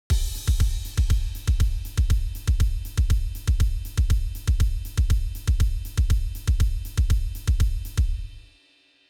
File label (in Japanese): サンバ; drum-yougo